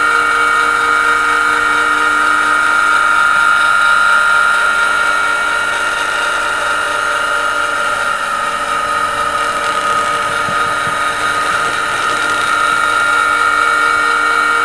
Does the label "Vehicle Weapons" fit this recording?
Yes